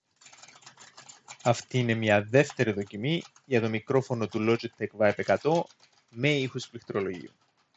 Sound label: With Keyboard; Logitech Zone Vibe 100; Wireless Headset